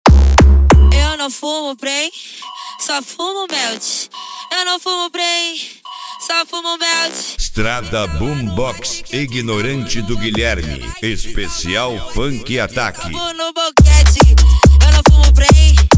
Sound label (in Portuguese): esp funk atack